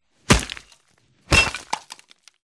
Tomahawk Impacts

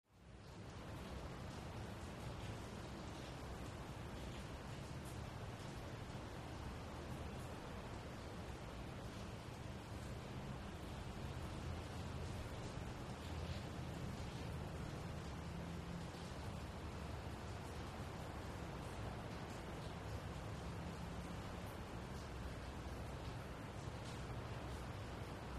Rain Distant Outdoo, int

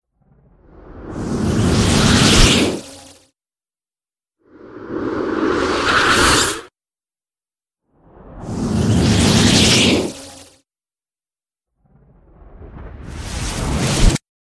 Flashback Entry Com, whooshes & swishes

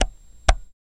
Beeper Switch Click; switches

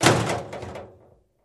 Garbage Can Impact

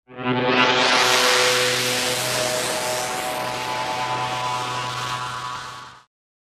Flanging Synth Tone

